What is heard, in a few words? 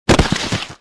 body fall human; player